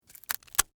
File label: Rope Cut Nylon, Industry, Rope, Cut, Nylon, Rope; Hand Tool; Tools; BlastWave